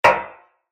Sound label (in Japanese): 足音 金属 単音 一歩目; モノラル